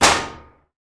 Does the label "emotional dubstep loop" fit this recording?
No